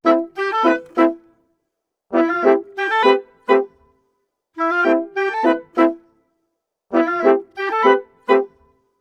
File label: 95 a min br + ww, Orchestral arrangements